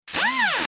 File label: Mechanism Fast Rev, servos